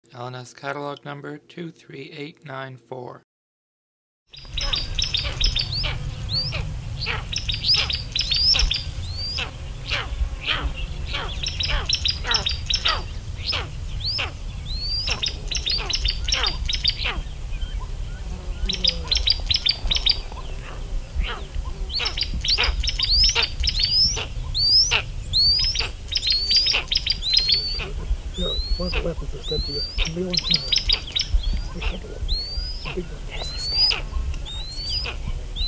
tawny eagle

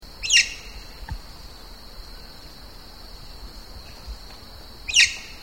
scaly headed parrot

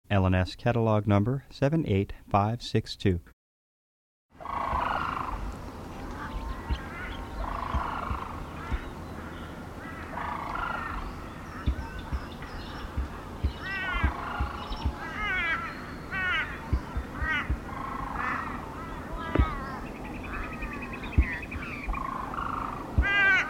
sarus crane

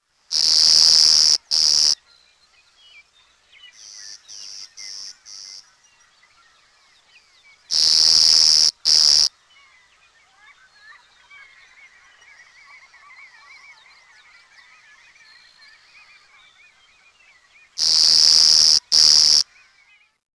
clay coloured sparrow

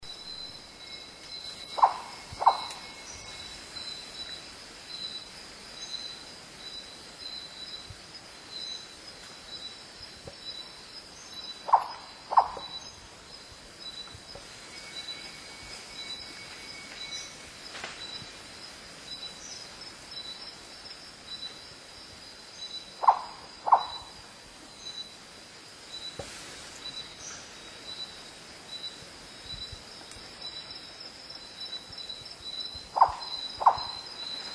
cinnabar hawk owl

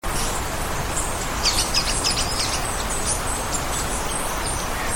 bar bellied woodpecker

